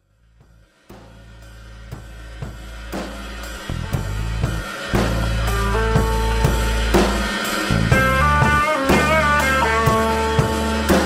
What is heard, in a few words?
Warm Up Jam